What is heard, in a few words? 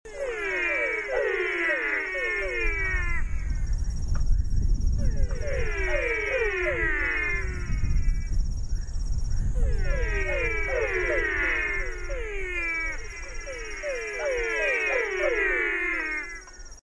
Physalaemus biligonijerus